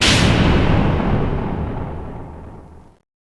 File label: drama pound